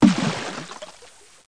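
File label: small splash; JONAH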